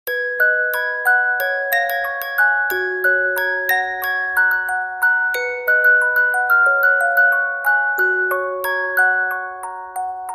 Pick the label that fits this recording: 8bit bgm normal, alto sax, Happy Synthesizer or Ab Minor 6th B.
Happy Synthesizer